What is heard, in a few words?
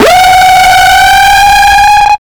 NUCLEAR, Hard & Loud Techno